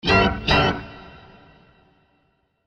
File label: Guitar Chord Musical Reveal Sharp Hits Scary; Horror Sound Design; Guitars (Sound Effects:Musical)